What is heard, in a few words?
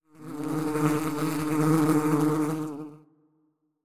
Beezzz